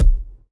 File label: Ball Player Hand Hit Tackleball Med